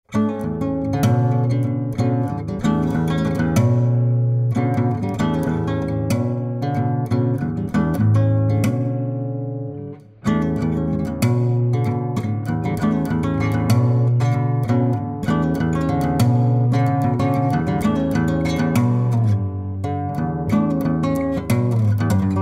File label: BOSSA